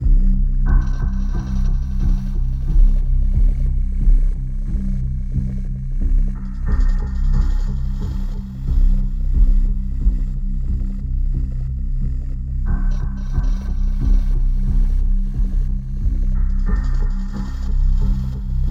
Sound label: Suspense, Loop, 90bpm, Classic Horror Sci-Fi Music; Dead Ark